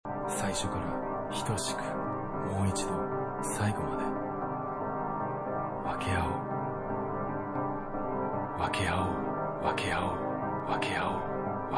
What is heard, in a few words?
Instrumental